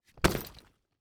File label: Sports Bag Duffle Bag Drop Cement Floor